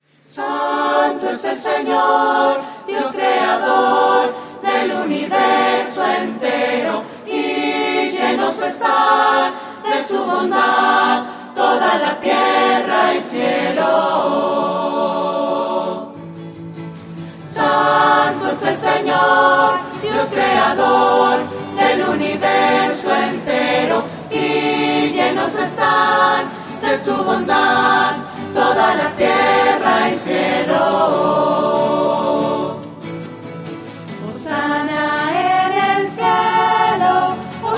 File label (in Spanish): santo fresa voces